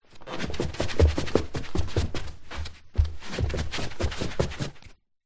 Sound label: footsteps barefeet running down carpet stairs; foley; zapsplat